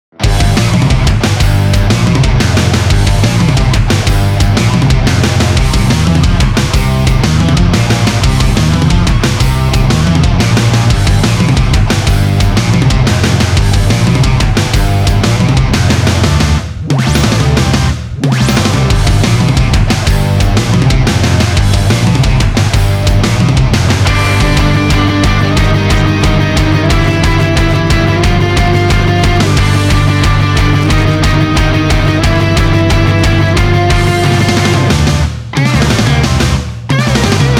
rock 180

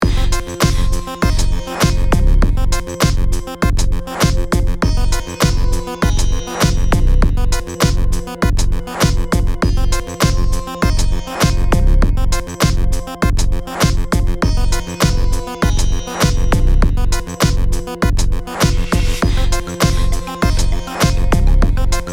Sitar Mystery 100

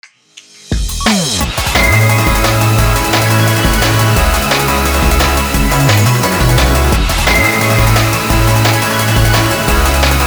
BGM Cyber

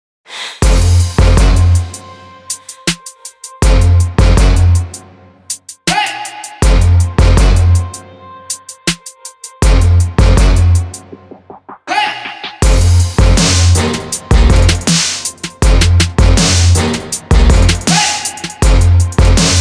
TRAP 80

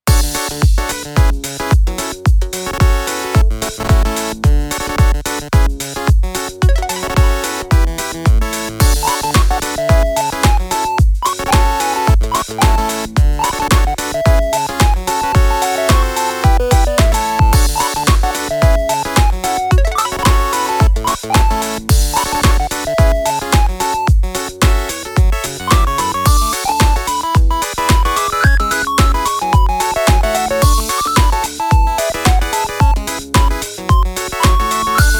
chiptune 110 long

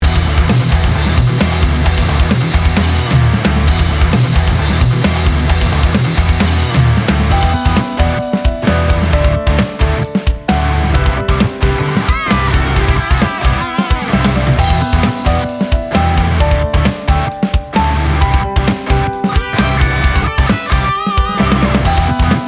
Emotional Rock 132

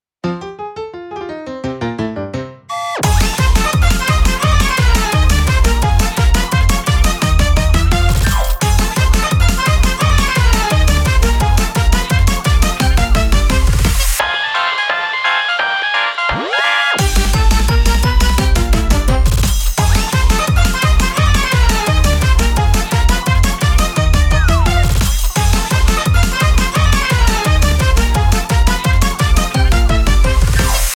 Rag EDM, 172

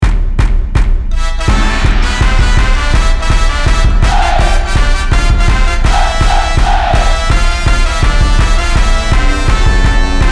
ouendan 165

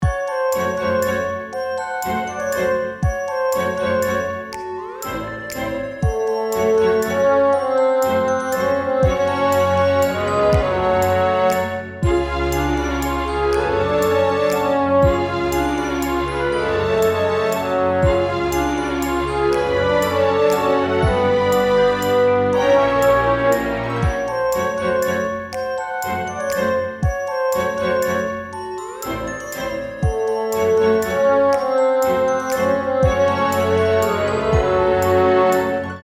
BGM Halloween 120 Short

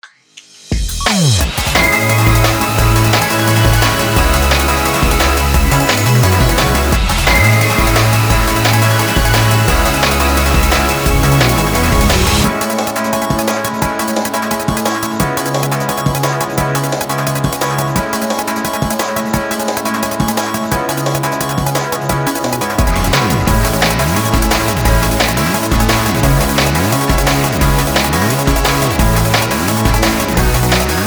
BGM Cyber Long

